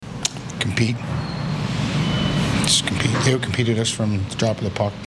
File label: bell